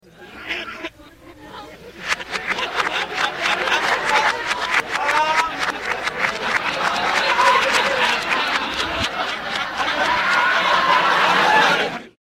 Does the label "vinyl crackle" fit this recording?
No